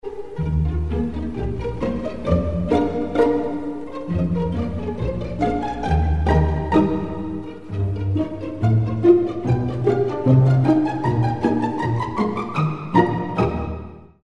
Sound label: pizzicato; whimsical; Delibes; Sylvia: Pizzicato